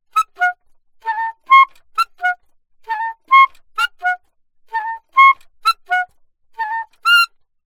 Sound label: altosax